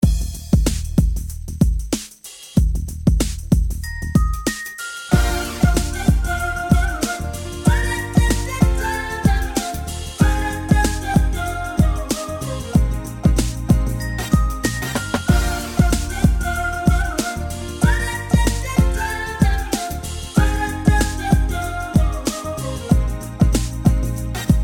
90s Pop

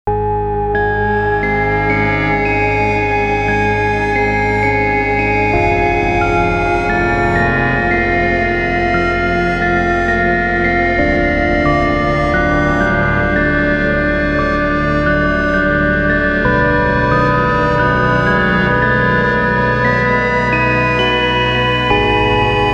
Elektron Digitone pad; Missingpad

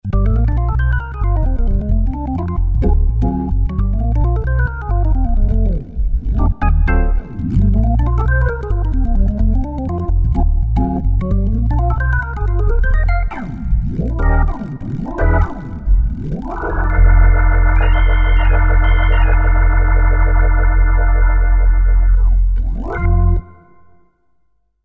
Crumar Mojo Classic; Anatroll; Max Tempia

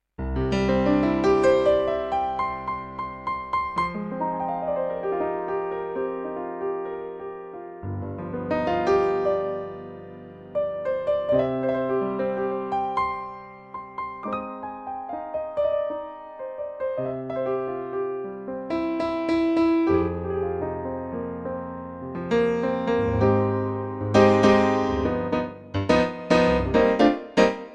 Grand Piano

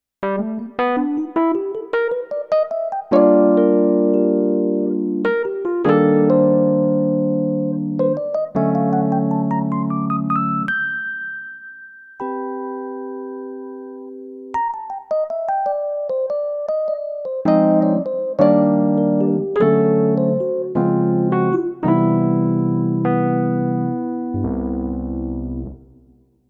Electro Rhodes

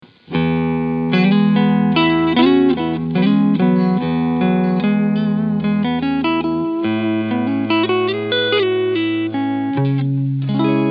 Cleansound Front Pickup; Maybach Stradovari

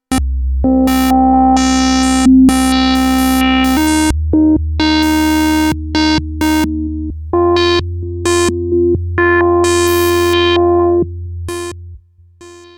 Roland SH-101

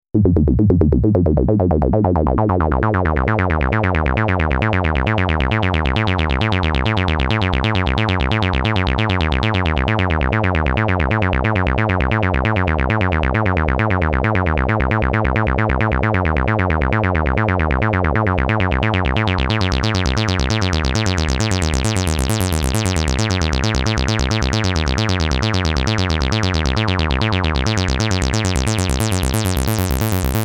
Mod Sequencer

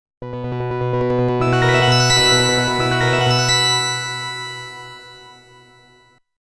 Harp with Novation PEAK

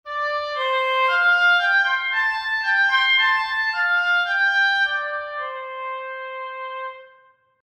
Oboe Dry

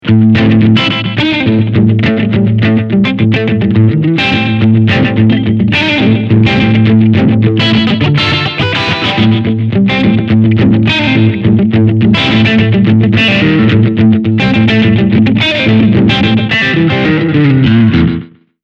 Slapback Echo

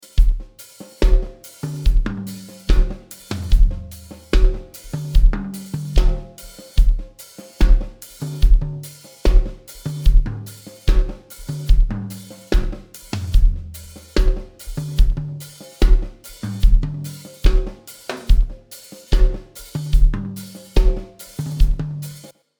Dirty Delay Drums

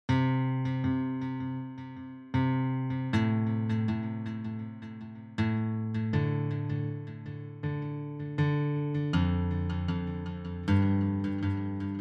Instrumentals

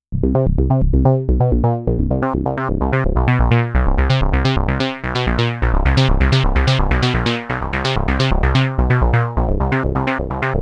noiseseq

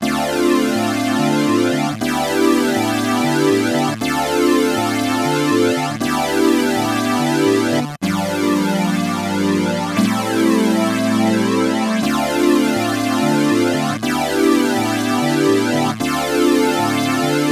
Sawtooth Synth Stereo Flanger

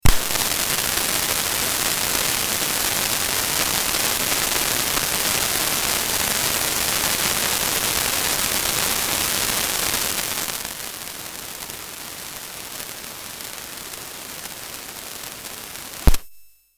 Metasonix D-2000 Gated Noise Tone

